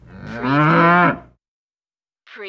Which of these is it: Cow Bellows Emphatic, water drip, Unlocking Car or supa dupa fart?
Cow Bellows Emphatic